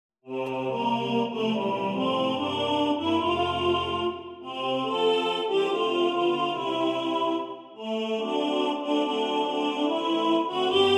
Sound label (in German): Tenor 1 im Fordergrund; Ihr Hirten seht zum Himmel auf